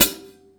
HH PD, Drum 1 Dry; East Collexion

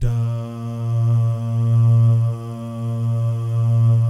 DAH, jazz FX, mix guy gal

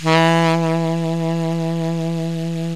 BREATHY SAX; OMI - Universe of Sounds

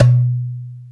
dumbek, African

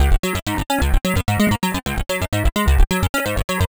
square show C# 129, synth loop; Zero-G; Soundclash; Nu Dance Electro

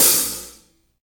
XV Hat O, Roland XV-5080

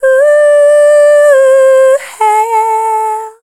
Dance Vocals, FEMALE

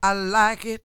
SING W, Vocal Planet Blues & Soul, BLUESY; ILIO

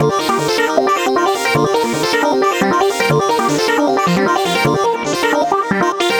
Synth Seq

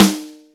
Dry Ring Snare; Proteus 2000; Digital Sound Factory Proteus 2000

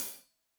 Hat Loose, Hi-Hat, Cymbals Stereo

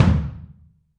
Kick, Drums Percussion; Kick 1 ModuIe

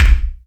BD, Yamaha RY-10, Drums

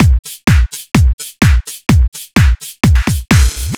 127 bpm full, drum loops; Zero-G; Soundclash Nu Dance Electro; Acidized Wavs; So Straight

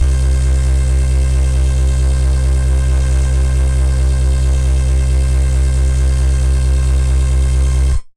Venus, Basses; AMG - Prototype Drum & Bass

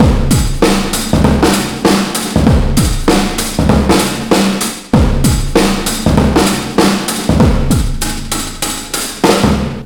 97.2 bpm, Breaks; BONITA; POTBreaks

